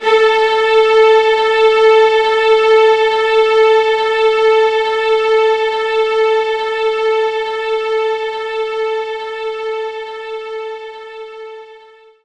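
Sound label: String Loop A3; knights try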